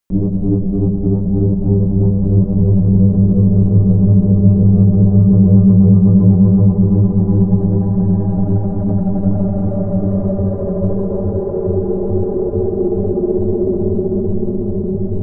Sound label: drone calm growling